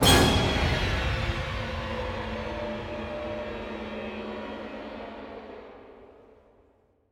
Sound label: Horror Stinger